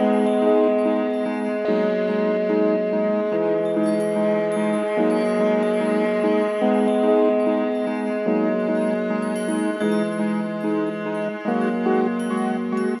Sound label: keys